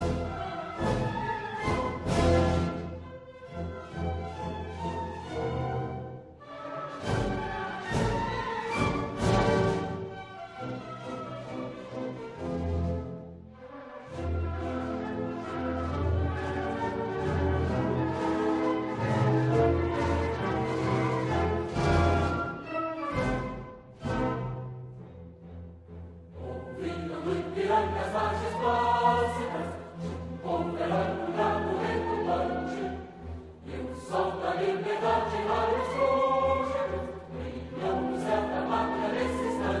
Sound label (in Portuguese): Hino Nacional Brasileiro